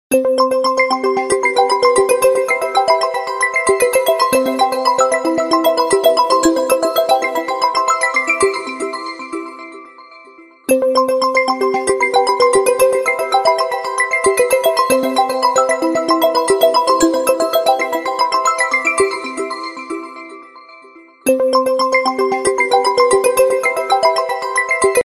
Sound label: soft light background music tone